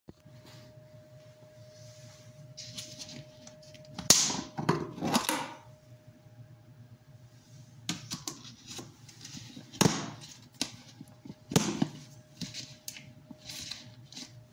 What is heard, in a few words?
plastic container